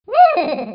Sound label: Laugh; Gario